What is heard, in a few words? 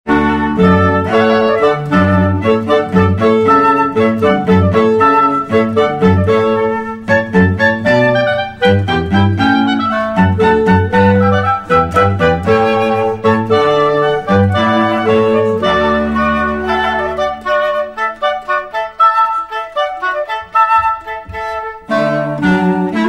Hornpipe